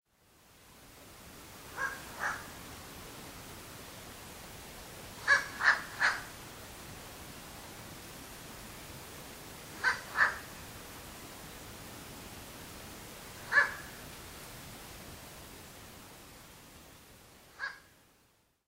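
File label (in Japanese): fukuro, Ural owl; osaka; koe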